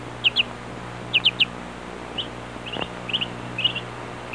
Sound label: Miscellaneous bird chirps